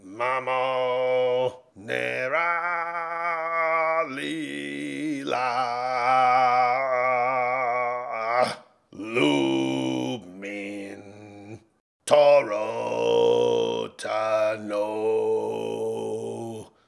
sing

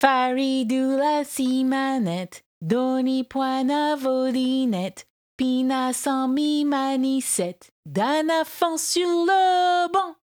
Sing; Hollow Knight: Silksong

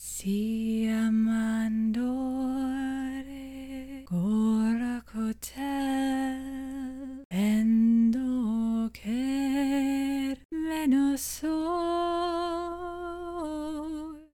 Female Enemy Song

